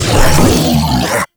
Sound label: Flying Mouth Attack Fire; Enemies; Warframe; ManInTheWall